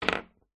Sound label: foley ballbearing set down wood surface; zapsplat